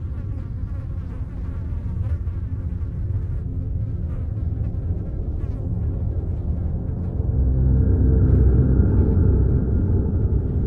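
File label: Dungeon ambience; Forget Me Not Annie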